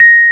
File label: A#5, Electric Pianos; Wizoo Electric Pianos